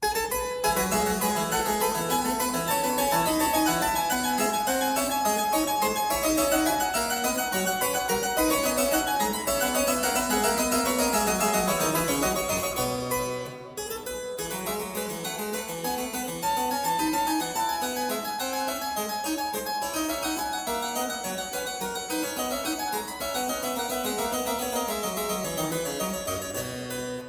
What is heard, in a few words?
bourree